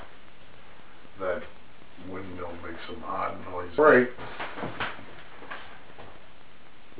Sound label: windmill right pos